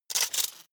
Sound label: metallic rattle click, robot; comedy cartoon